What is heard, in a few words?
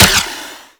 Flare Launch; Archwing